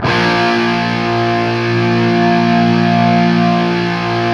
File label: 5TH A#1, guitar; 5THS LOOPED; East West; Steve Stevens Guitar Samples Collection